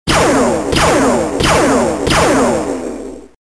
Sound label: gun shots